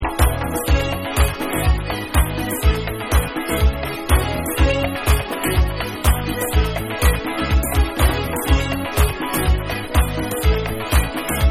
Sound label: Instrumental